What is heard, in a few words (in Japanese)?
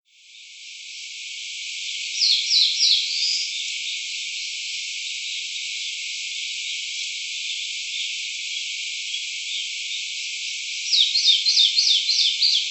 sendaimushikui; KawakamiSannokou; Uemura Shingo